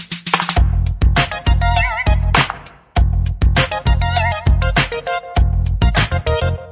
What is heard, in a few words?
default dance music; fortnite